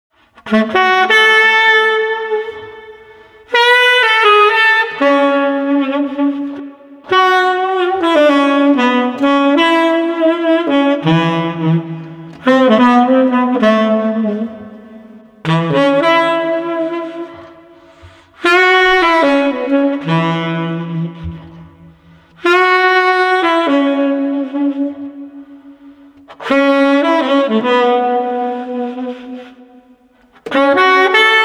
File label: Sax Enlightened 120